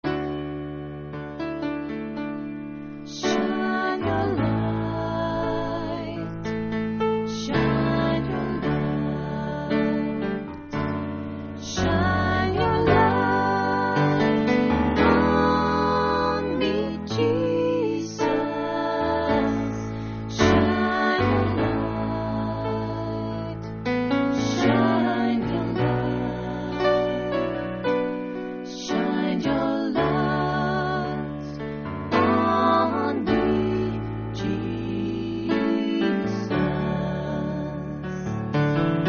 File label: tenor